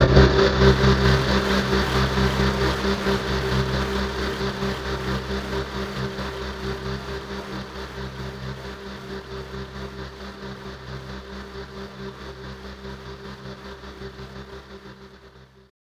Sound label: AMBIANT, Special FX Twisted; USB Soundscan; AKAI